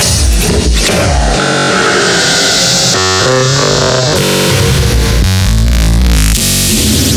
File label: Glitch Loop